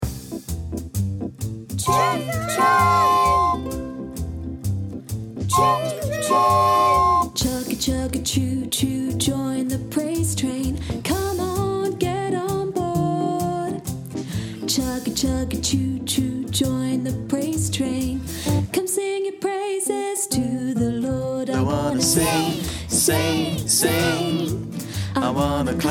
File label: Song